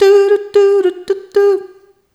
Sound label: Rhythmic G